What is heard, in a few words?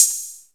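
OPEN HAT, DRUMS; Kid Nepro; Elite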